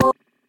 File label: flash